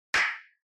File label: Castanets